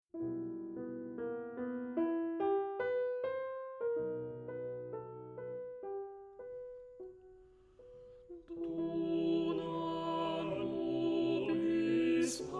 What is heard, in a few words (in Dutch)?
Sopraan, Agnus Dei; Rossini; oefen CD; Onversterkt